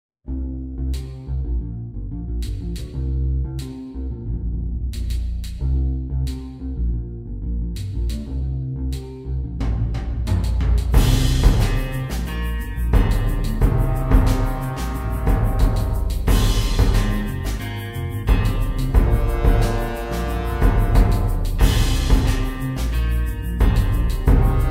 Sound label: FUNK